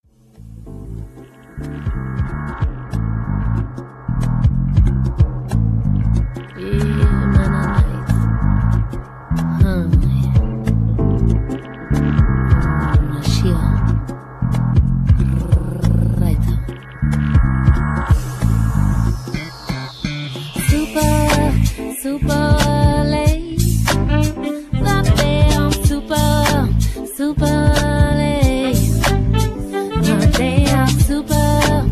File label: eurogroove